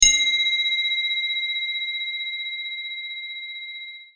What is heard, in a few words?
crotale